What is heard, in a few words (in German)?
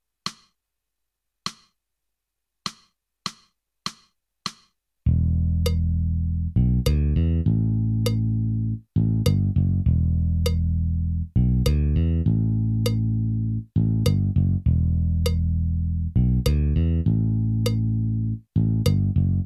Metronom auf 2+4